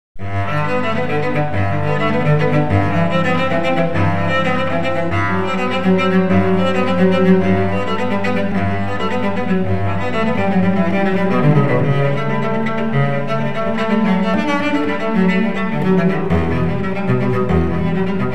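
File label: unaccompanied cello suite; 사장조; 무반주첼로모음곡 1번 사장조 bmw 1007; bach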